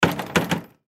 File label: Hospital Door Close; Emergency